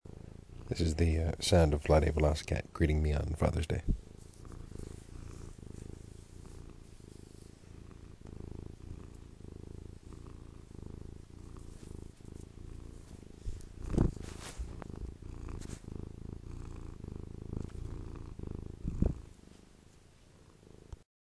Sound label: cat wakeup